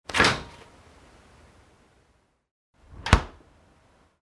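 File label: zvuki holodilnika, refrigerator sounds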